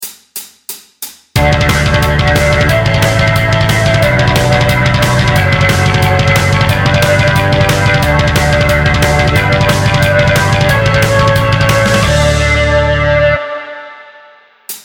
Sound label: Gallop Picking Guitar Backing Track